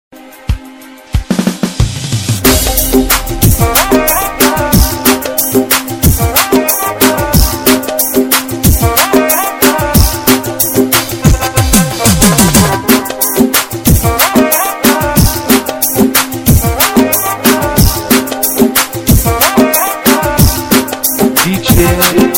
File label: Rai Ringtone